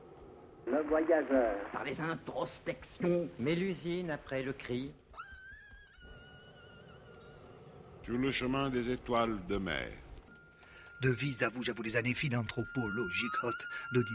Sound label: canto